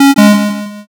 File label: error